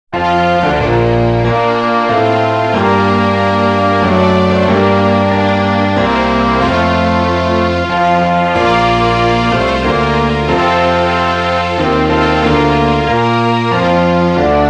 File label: Star Spangled Banner; Soundzabound Volume 2